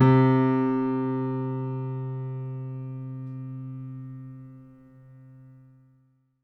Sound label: MIX L, Acoustic Piano; InVision Interactive; Miroslav Vitous